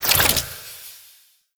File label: Quad Sniper Zoom Out; Warframe; Weapons; Tenno